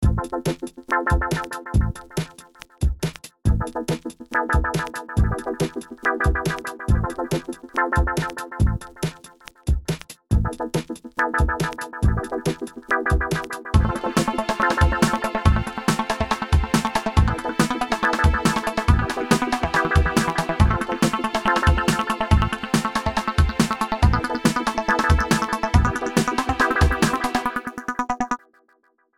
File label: hydrolik beat